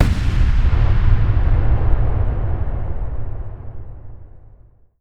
homing missile destroy; weapons; dota; gyrocopter

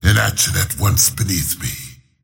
teleport pain; slyrak; dota

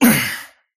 parry fail; dota; kez

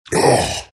morph pain; terrorblade

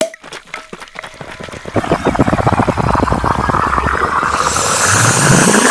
unstable concoction fuse; weapons; dota; alchemist

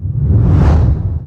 meteor cast; weapons; dota; invoker

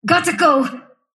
sylph move pain; dota; dark willow

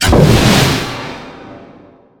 adaptive strike agi target; weapons; dota; morphling

